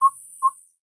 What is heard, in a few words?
natura sms; Spik